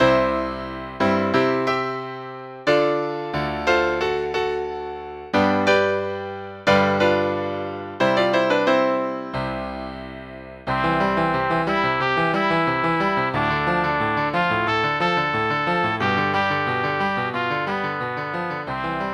Pod tým našim okenečkom pre trúbku a klavír

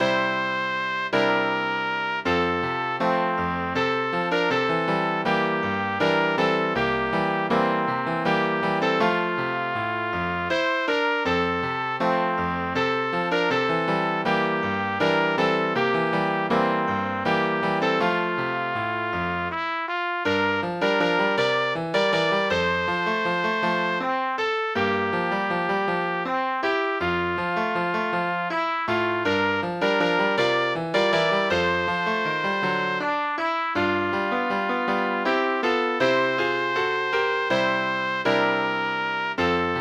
pre trúbku a klavír; Ty jsi moje, první láska

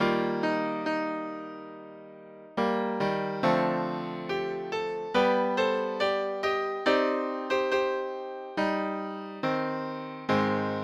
pre trúbku a klavír

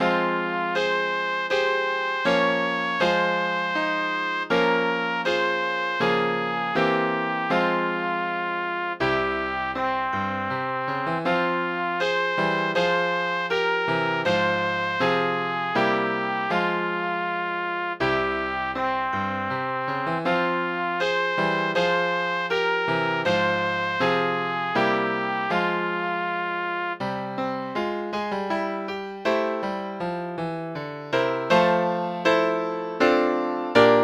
Dve z Moravského Slovácka - pre trúbku a klavír

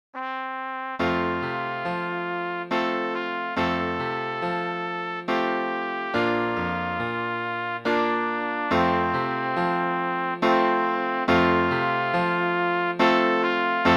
pre trúbku a klavír; Amazing Grace

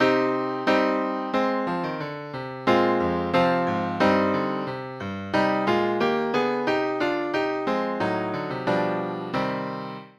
pre trúbku a klavír

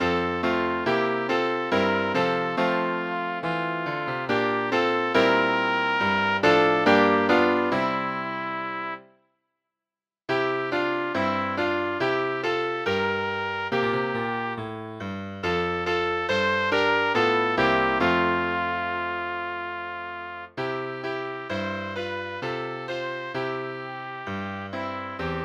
Zasviť mi ty slunko zlaté - pre trúbku a klavír

